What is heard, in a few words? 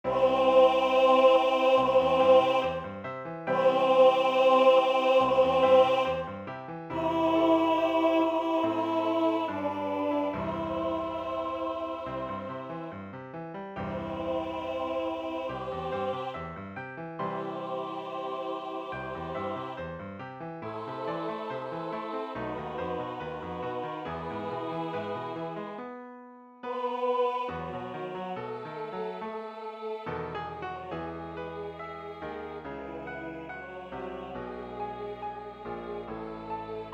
tenor